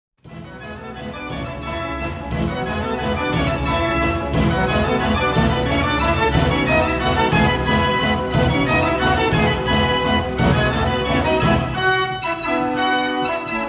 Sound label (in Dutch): draaiorgel